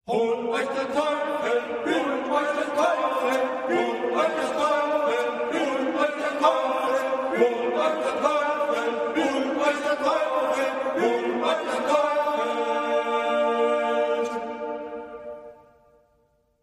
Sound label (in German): Vierstimmiger Rätselkanon; Hol euch der Teufel B hut euch Gott